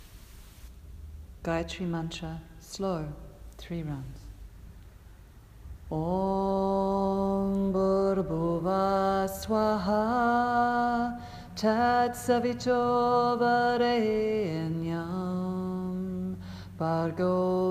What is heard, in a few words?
Gayatri Slow